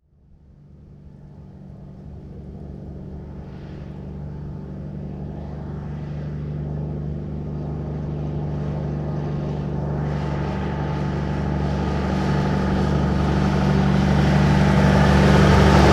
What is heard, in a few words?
1954 Big Rig Ext Slow By; Period Vehicles